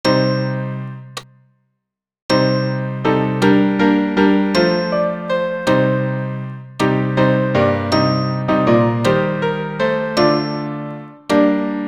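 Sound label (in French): Credo tous piano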